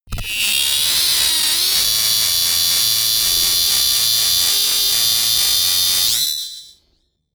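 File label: slow chord riser, science fiction; sciene-fiction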